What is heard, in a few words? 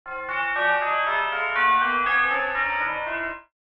Cartoon chimes ascend